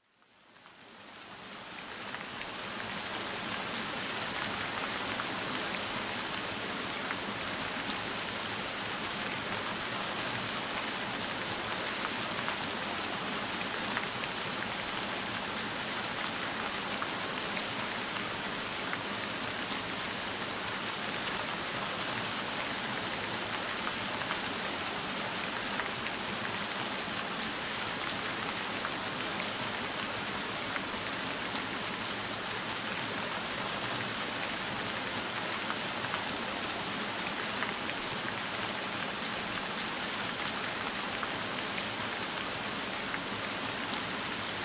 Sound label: Rain